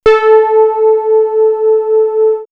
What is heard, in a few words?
Swirls Pluck, pads; X-Static Goldmine